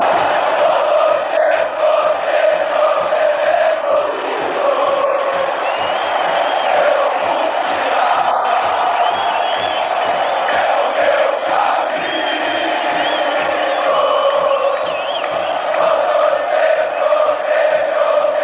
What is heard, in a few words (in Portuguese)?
vou torcer pro gremio bebendo vinho, chant